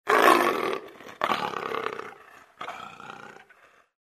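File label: sounds of leopard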